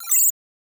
neurointerface in

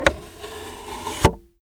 Event Start Attention

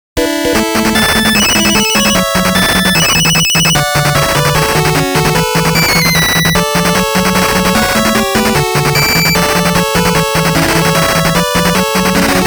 All Clear; senjou no ookami top secret original sound collection 2015

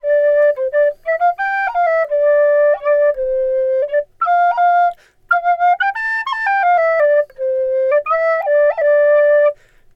Flute Player Melody; gamerip; The Witcher 3 Wild Hunt

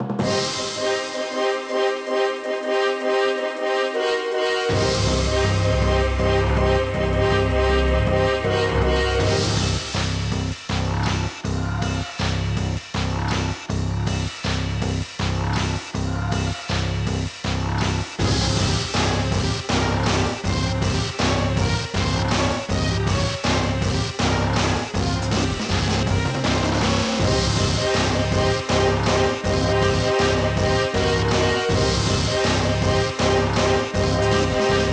Bgm Battle

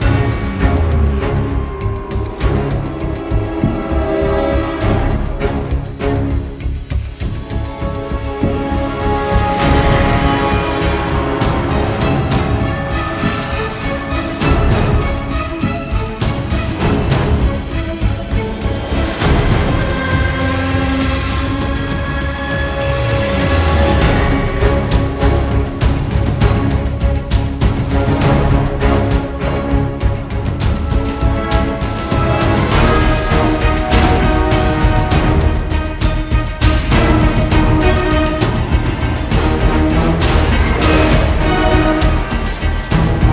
Waves Common Battle Light Epic

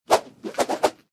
Laundromat Swirl; Doodle Jump 2; Android

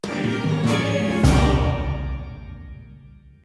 task medium; Wilderness

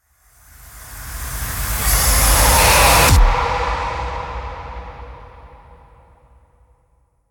Main Ambient; Doom Eternal; PS4; Stadia; Xbox Series X/S; Blood Harvester

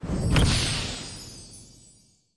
Ui Chest Open; gamerip; Beast Quest; ps4

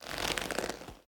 Leather Foley; The Town Sam; Devour 2021 gamerip pc macos

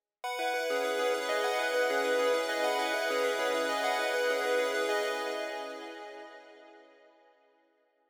Synth Layer

